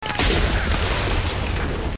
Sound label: bullet hit